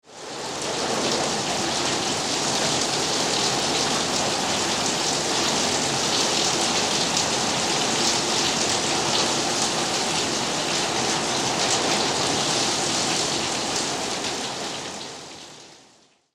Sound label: rain storm